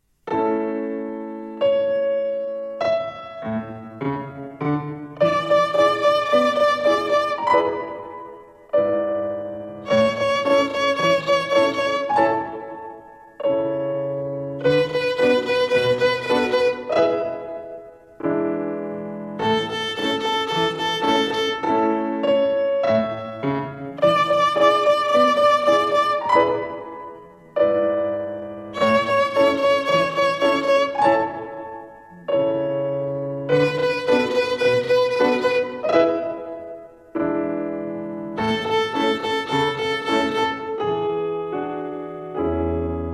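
Rhythm with Three Fingers Fifth Twinkle Rhythm, violin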